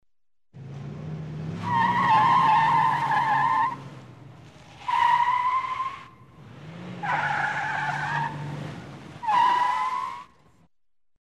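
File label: sounds of car brakes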